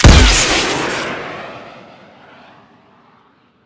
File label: pocket rocket shoot crit; tf2